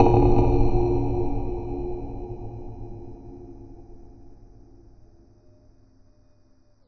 big clank

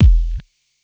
BD Low bit crynch, DRUMS; Polyend; Legowelt

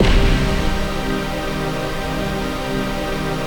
HOUS PAD, House Dance; 90 s Sample CDs; Best Service Dance Mega

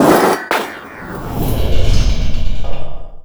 PLASTIC; USB Soundscan vol.33; AKAI; BLASTER

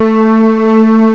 LOW STR, LOSTRING; M8; CMI Factory

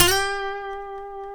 Guitars FUNK; Spectrasonics; Hans Zimmer Guitars VOL-1; TAKA FUNK

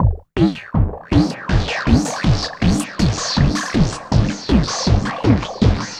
160, Drum Loops; Total Drum&Bass

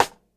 SIDESTICKS

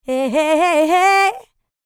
licks, vocal, dance; ILIO; Vocal Planet

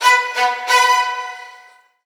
STRGS, Roots Funk; USB Soundscan vol.41; AKAI

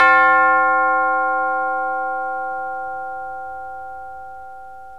VOICE & BELL

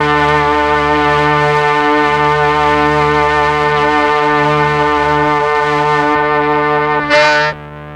MEL STRING; ILIO; Propeller Island

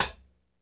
LOFI HAT; LO-FI KITS; Spectrasonics - Burning Grooves CD2

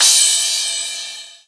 Crash, Cymbals

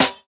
Snare, jungle breaks drum sounds

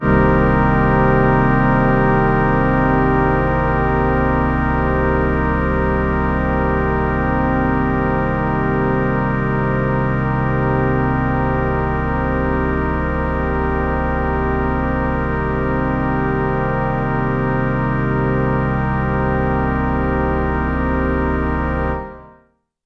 ORGAN MIN, Synths

